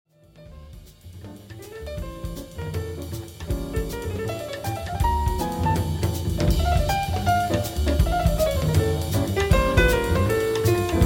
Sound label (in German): Präludium d-Moll